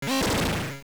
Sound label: Boss Scream Distant, Dungeon bosses screaming (distant); LOZFDS